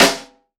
Snare SSL

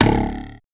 Perc Gongong; pitchglitch

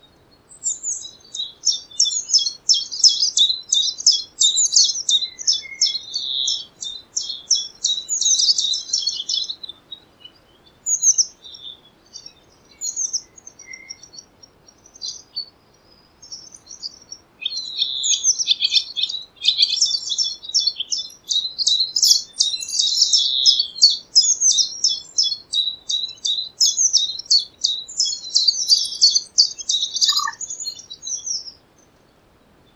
countryside birds ambient; Kinoton

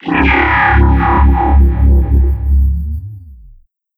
Sound FX Time Travel Bass; Primordial

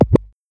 Sound FX Scratch Impact

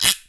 Perc Guiro

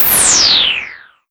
Hoover Car

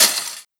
Cutlery

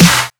Snare

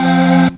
GHOST; foxdot default

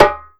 Perc Djembe Slap; Circ Afrique V4

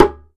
Perc Djembe Tone; Circ Afrique V4

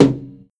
Tom High

